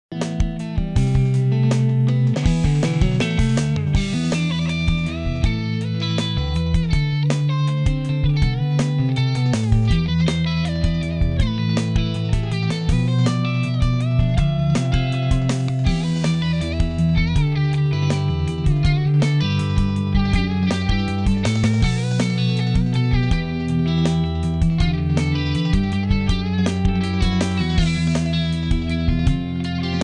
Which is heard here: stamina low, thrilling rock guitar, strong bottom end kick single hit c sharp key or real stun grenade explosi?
thrilling rock guitar